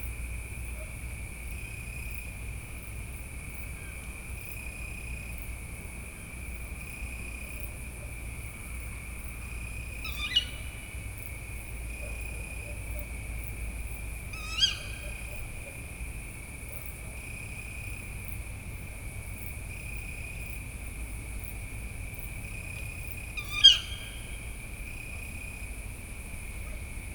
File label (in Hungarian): macskabagoly közelről; vízház; termeszetben